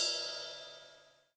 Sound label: Open Hi Hat, Live Kit; neuro; Stanza